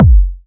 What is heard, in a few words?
Techno Kick